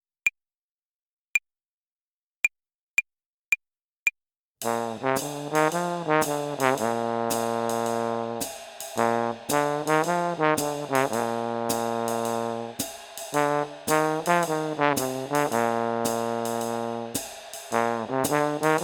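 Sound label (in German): Swing Phrasierung Übung; play along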